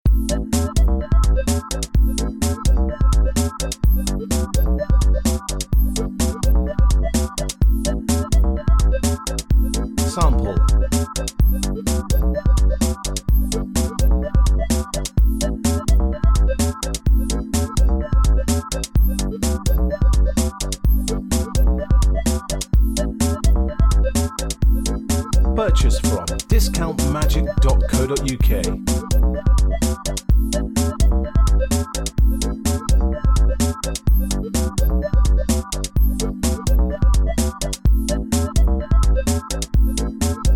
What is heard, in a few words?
electro, backing